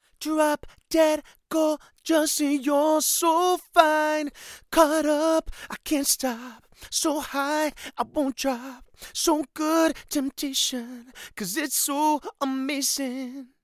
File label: male vocals; lewitt